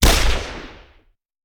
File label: Hand Gun; Sound Ideas; Guns